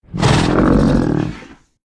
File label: bantha; Star Wars Knights Of The Old Republic I; XBOX